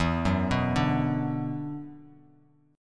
E 7th Suspended 2nd, down, Bass Standard; E7sus2; arpeggiated

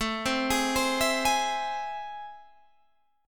A Minor Major 7th, down, Guitar, Open G

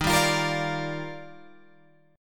D sharp Major 9th, Guitar Open G

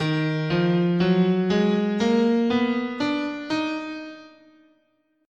D sharp Harmonic Minor, scale

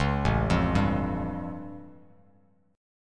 A Augmented 7th A Bass Standard; arpeggiated; JGuitar

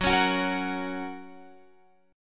Gb Major Gb down Guitar Open F; Gb strummed; JGuitar

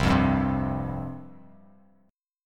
C Suspended 4th C, Bass Standard; Csus4 strummed; 8,8,5,5; JGuitar

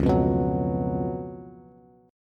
C sharp 11th C sharp, Bass; strummed; C#11 Chord; JGuitar

